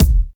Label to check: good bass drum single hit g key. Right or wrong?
Right